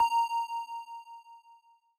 Quiz Gameshow Correct Ping

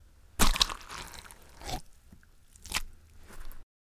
Cantaloupe Insides Punch And Splat

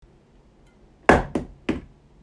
Hit The Ground

